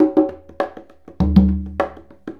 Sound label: Congas; Essential Percussion